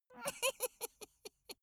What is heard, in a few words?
dwarf laugh sneaky